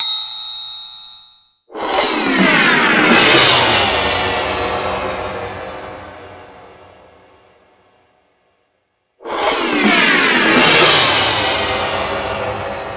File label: Lazer Drums, E-MU Pro-Cussion; drum machine